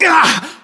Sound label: pain, english